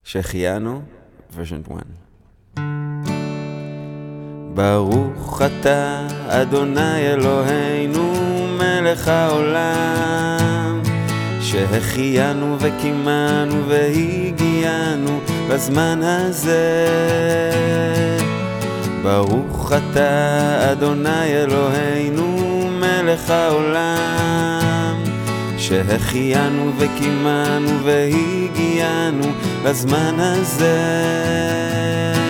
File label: shehechiyanu